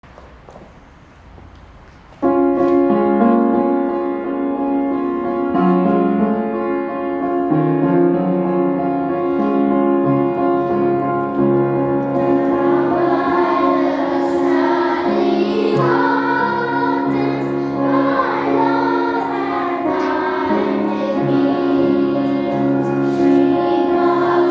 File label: Choir; DKH